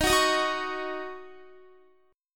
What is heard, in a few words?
D sharp Minor 7th, Guitar; strummed